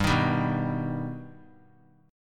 G Augmented G, chord; G+ strummed; JGuitar

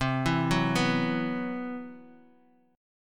C Major 7th Flat 5th C

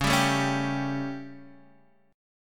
G Suspended 2nd Flat 5th Db, chord

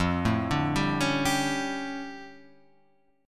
D Minor Major 11th, F

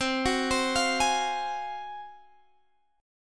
G sharp 13th C

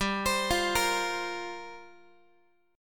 G Minor 11th, Guitar; Gm11 arpeggiated; x,15,15,15,14,x

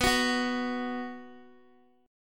B 5th B Guitar, chord; strummed; 18,21,18,x,x,x; JGuitar

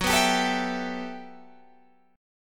G Major 13th

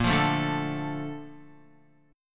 Bb Major 7th, Bb, down, Guitar; BbM7 strummed; JGuitar

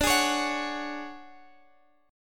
D Major 7th Flat 5th Guitar Drop D, chord

